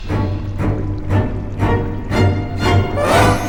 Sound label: scary music